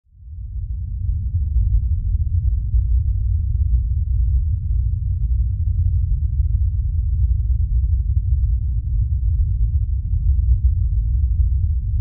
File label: Hum, atmosphere of depth, water